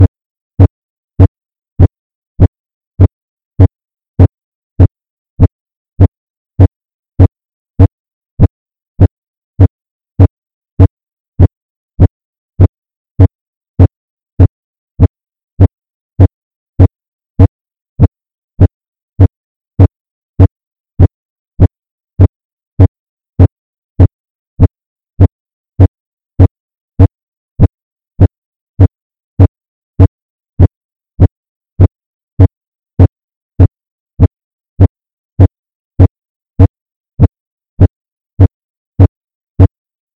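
100bpm low frequency metronome track higher volume; tested with woojer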